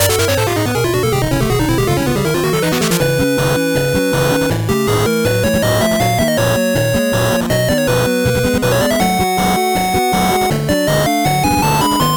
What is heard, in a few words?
8bit bgm slow